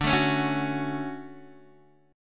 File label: D Major 7th, D, down, Guitar DADGAD